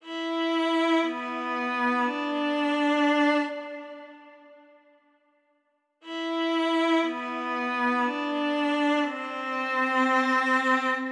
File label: violin medly; Clueless